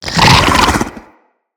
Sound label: Infested Ancient Run Breathe; Enemies